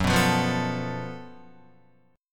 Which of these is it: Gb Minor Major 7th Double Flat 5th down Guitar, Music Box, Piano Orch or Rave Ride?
Gb Minor Major 7th Double Flat 5th down Guitar